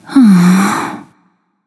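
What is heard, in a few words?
nonverbal sigh; smite; voicepack; izanami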